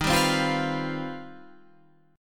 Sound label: C Minor Major 11th Eb